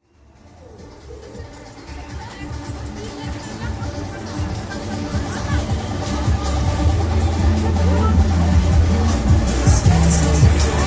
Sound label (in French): fete foraine